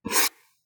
slice short; CinemaSpice; Mograph Mini Transitions

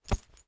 Impact Cloth Hit Pocket With Keys

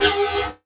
Multimedia Internet CD-Rom Flash Wipe Electronic

